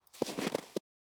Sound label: Snow Walking Single Step; SoundsCrate